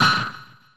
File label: Snare